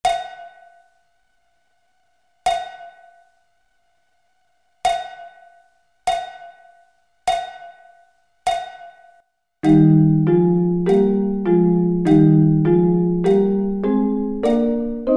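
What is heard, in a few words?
E flat Double Stops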